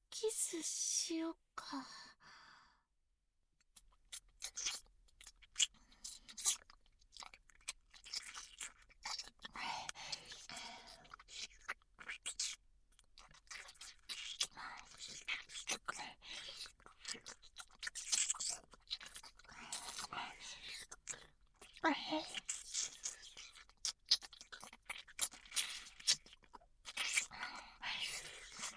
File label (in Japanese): キスループ